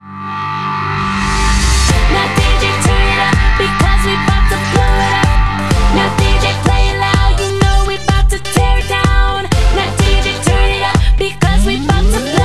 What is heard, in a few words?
Dance Beat; Model Oshare Audition Dream Girl; 3ds gamerip 2013